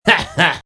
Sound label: Knifekill